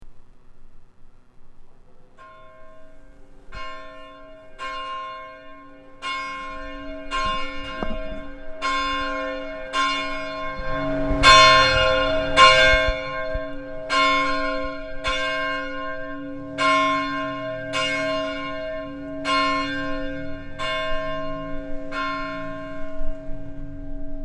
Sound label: Church Bells; Burritts Rapids